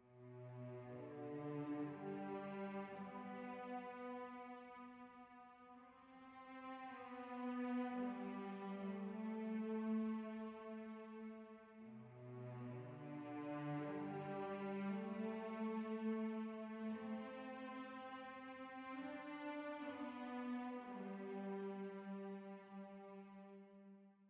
Stinger Gameover Positive Stem Celli

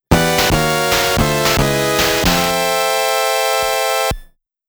GAME OVER; ファイナルブラスター(PCE)

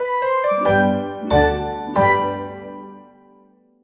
Clear!; Picross 3D 2

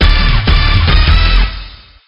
Jingle

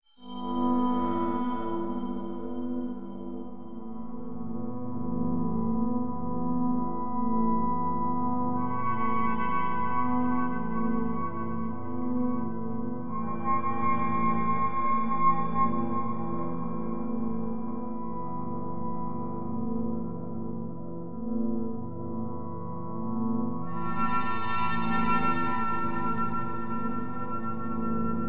Ambient 1-warstwa 2+

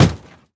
plastic hit; teardown; ps5 windows xbox series xs

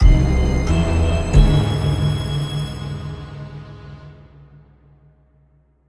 Wraith Victory; Rook's Keep Online

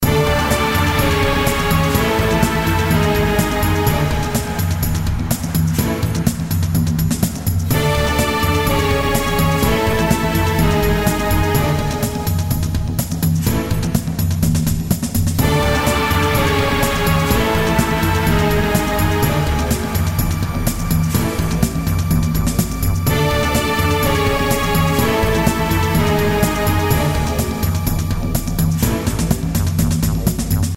China Boss; the mummy the animated series; ps2 windows

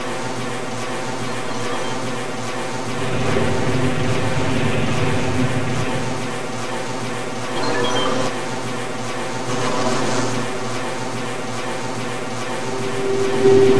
Ambient storm; Amiga; Bonus

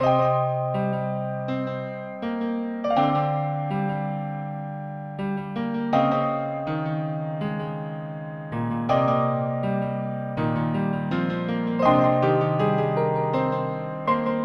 Stage Snow piano; One Way Heroics Plus; soleil-musique

